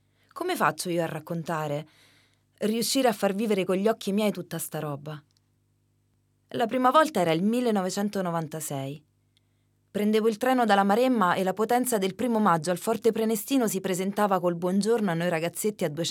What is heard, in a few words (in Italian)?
solo voce